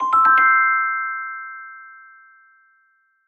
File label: C Chord